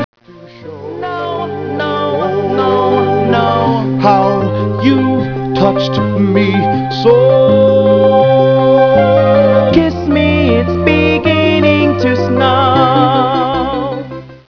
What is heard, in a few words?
bells